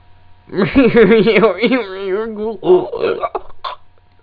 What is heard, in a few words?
sad laugh